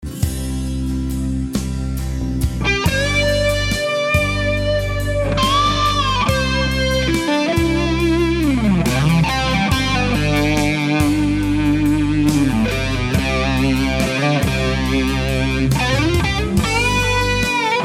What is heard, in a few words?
Ram Muff; Solo with backing track